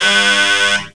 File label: beeeee